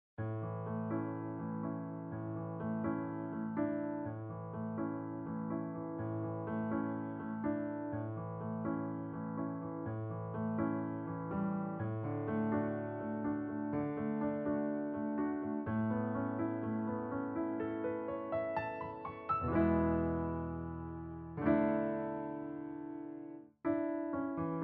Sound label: song piano; Little Mermaid; Ariel; Accompaniment; Disney Cruise Line